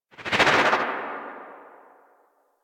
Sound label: Arch Wing Atmosphere Flyby Right, Vehicles; Movement; Warframe